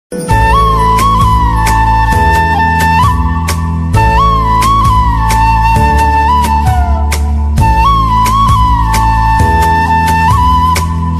new ringtone new flute ringtone new bansuri ringtone new instrumental ringtone